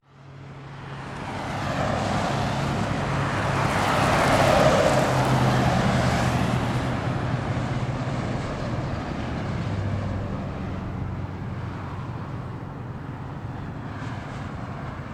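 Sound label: cars, traffic jams and car traffic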